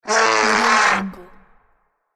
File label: game character creature voice demon scream